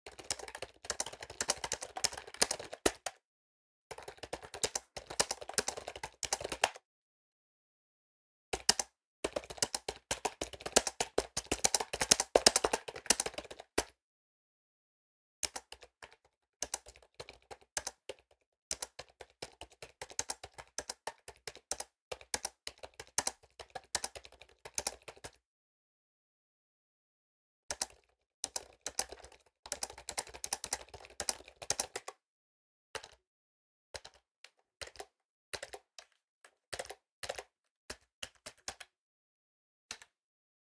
keyboard; NS Studios - ultra sound collection

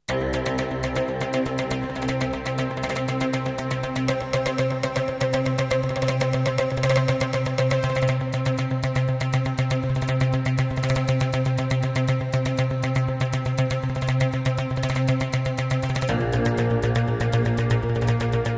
Jingles; 90 DDD Jingles

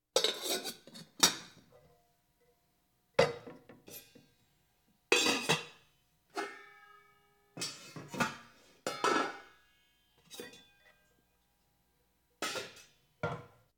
Household Phones

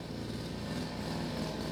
chain saw idle; devices accessories utilities; NS Studios - ultra sound collection

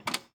Ferrari Dino Door Open; Automobiles

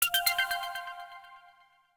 notice; Quentin C's Gameroom

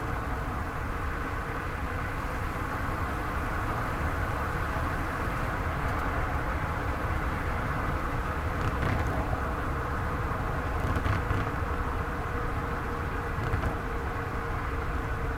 Tires On Asphalt, Speed Bumps stereo loop; Blind Drive

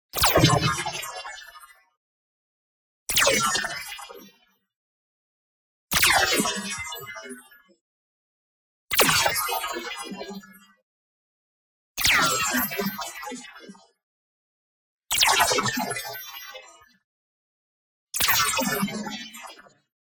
IMPACT SMALL Crystal Decompose; boom library; Matter

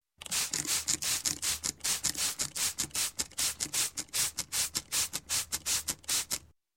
Spray Can Spray Bottle; Sound Ideas 2000 Series

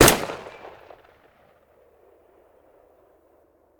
Rifle; Sound Ideas; Guns

